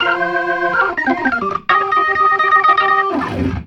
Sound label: RIFF, ORGAN RIFFS; InVision Interactive; Keith Emerson